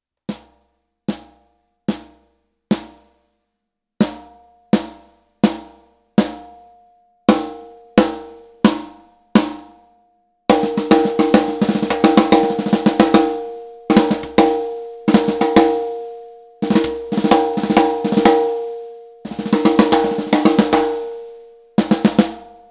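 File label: Gretsch Black Alu with Ambassador, snare; Anniversery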